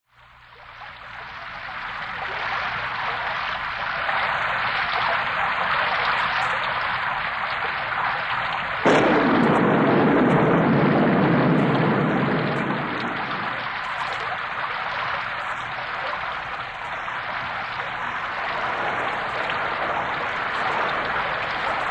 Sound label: Thunder And Rain; SOUND EFFECTS1